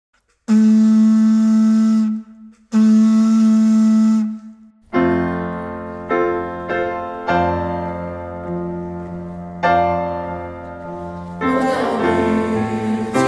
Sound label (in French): Hymne, Choeur d'enfants voix